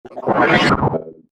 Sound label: Bloated Whoosh Fast And Garbled; whoosh sound effect; swish, sweep and swoop